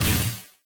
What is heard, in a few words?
Guardian Shell Impact Default; Warframe; Focus; Vazarin